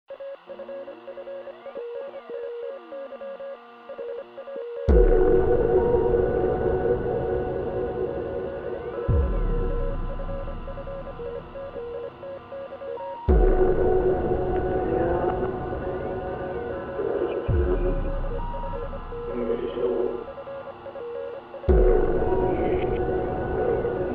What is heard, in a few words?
instrumental version